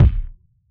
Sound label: hip hop kit kick